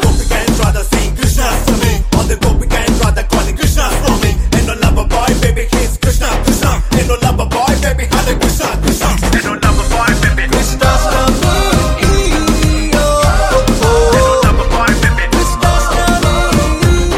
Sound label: Theme Ringtone